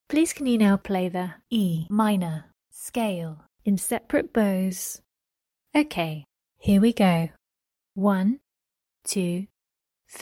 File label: e min scale in sep bows